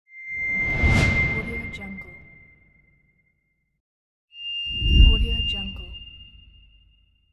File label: shine swoosh